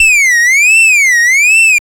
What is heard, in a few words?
HOUSE ALARM; Masterbits - Tekkno Trax; MACHINES+FX